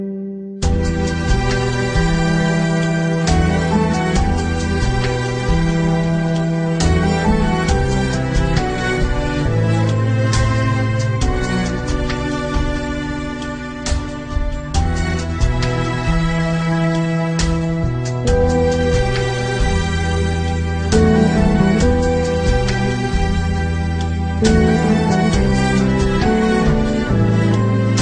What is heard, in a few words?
Ringtone Mobile